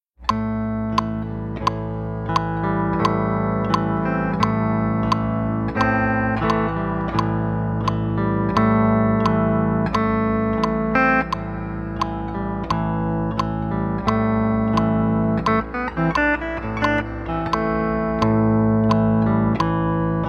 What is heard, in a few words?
Deep Forest click and drone, pedal steel